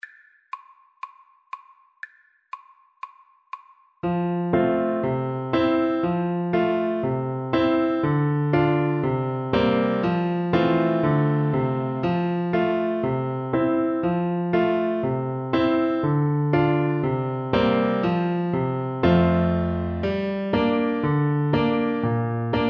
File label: Tuba